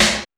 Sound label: Techno C; EMU XL-7